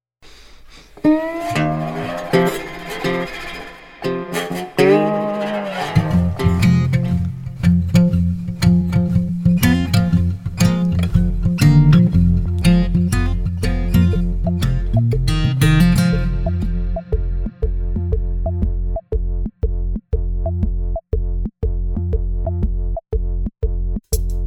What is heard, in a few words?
Electro Acoustic Pop Suspense